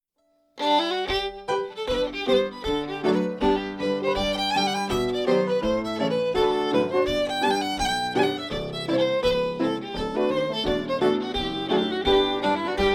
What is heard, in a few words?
Hornpipe; Fairies Hornpipe